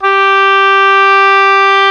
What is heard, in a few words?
OBOE, Orchestral Winds, Oboe mf f